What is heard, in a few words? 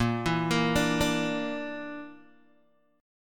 Bb Major Flat 5th, Guitar, Open G